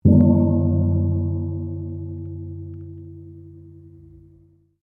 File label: GONG NIPPLE INDONESIAN 20 INCH P, Indonesian gong modern copy; Cymbals; Musical